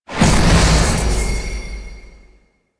Button Timer Failed; Medusa Puzzle

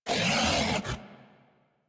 Feral Vocal Melee Attack; Warframe; Kubrow

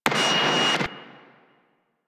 Static Out A; DE; Warframe; Night Wave

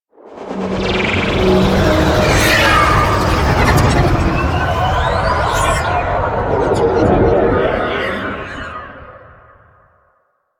Insect Liset Drop Off; Liset Flight

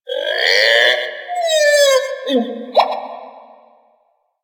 Night Critter, Valley; Ambience; Warframe; Quests; The New War

